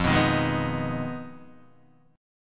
F Minor 7th Flat 5th F down Guitar DADGAD

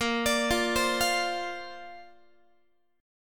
A sharp Major Add 9th, Guitar; arpeggiated

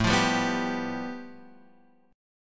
A 7th Suspended 2nd Sharp 5th A, Guitar Open F; strummed

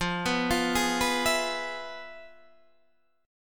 F Minor Major 7th Flat 5th Guitar Standard; FmM7b5 arpeggiated; JGuitar

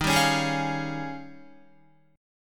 Eb 11th Eb, down, Guitar, 4F,4A,5C,5G,5C,6E; strummed; JGuitar

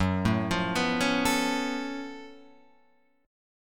Gb Minor Major 11th Gb down Guitar Open F; GbmM11 arpeggiated; JGuitar

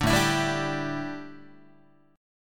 A 7th Suspended 4th down Guitar, chord; A7sus4 strummed

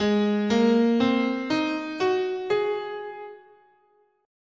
Dominant Pentatonic, scale, guitar; Gsharp

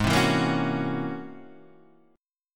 Db Minor Major 13th Ab

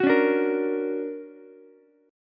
D Augmented Major 7th, down, Ukulele

